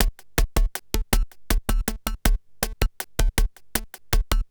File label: Beats, Industrial